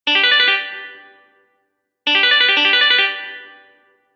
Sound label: sweep